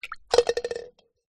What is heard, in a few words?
food egg drop into pan of water; zapsplat